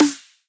Snare; 7rystan's 600+ FREE EDM SAMPLES & DRUMS; Percussion

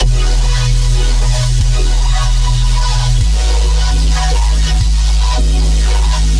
bassloop Felt BMaj 150; CYBER

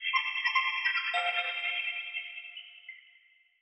glitch F maj; fx; bespoke - Floral v.1

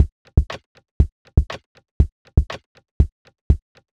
drum loop 120bpm; drums; loops; bespoke - Floral v.2

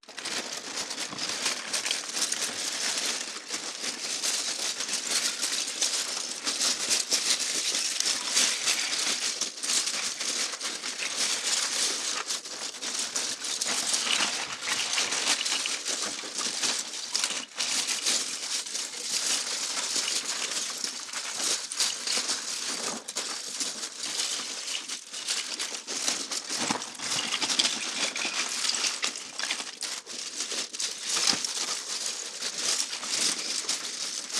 foley; fx; bespoke - Floral v.1

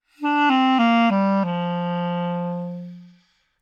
clarinet phrase Bbmaj 100bpm

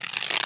Iguana scales, Foley; SFX; 7rystan's 600+ FREE EDM SAMPLES & DRUMS

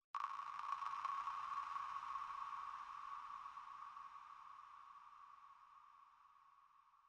impact; bespoke - Floral v.1

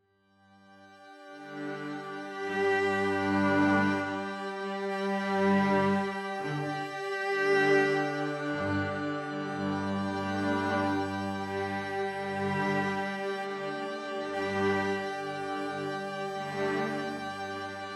pad violet G maj; synths pads; base pack

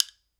percussion; bespoke - Floral v.1

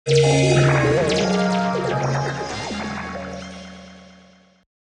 melodic fx digital doodad C Maj; base pack

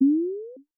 laser; bespoke - Floral v.1

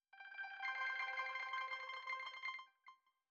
granular piano Cmaj; bespoke - Floral v.2

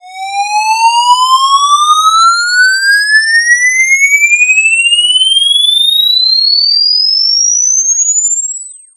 Sweep; 7rystan's 600+ FREE EDM SAMPLES & DRUMS; SFX

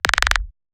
perc gate; drums; percs, foley; base pack

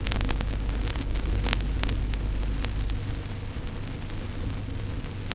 Vinyl; SFX; 7rystan's 700+ FREE COLOSSAL EDM SAMPLE PACK

